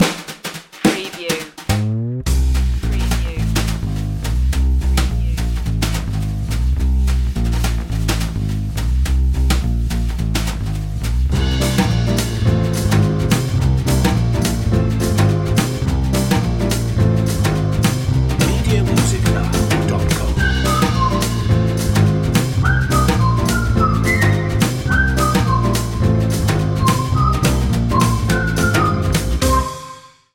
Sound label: magic whistle